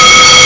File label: fire alarm